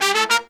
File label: Horns, 96BPM; Phantom Horns; 90 s Sample CDs; Zero-G